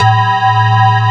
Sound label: METAL BAZZ